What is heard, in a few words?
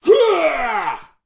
soldier battle sounds effort; Menador; SyrinScape; Iris hill manor